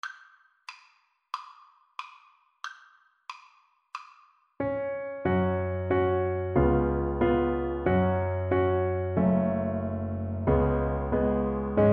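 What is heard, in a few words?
viola karaoke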